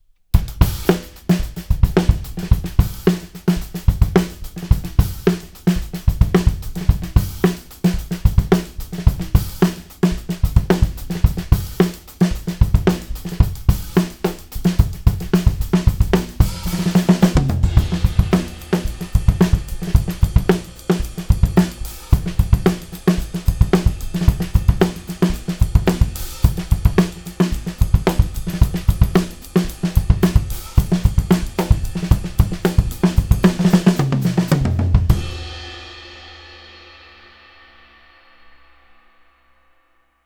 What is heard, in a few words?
FULL DRUMSET